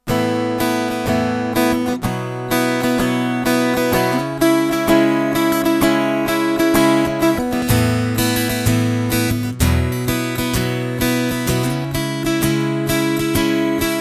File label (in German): gitarre bodyrez wechsel